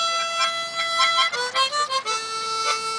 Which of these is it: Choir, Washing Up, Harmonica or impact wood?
Harmonica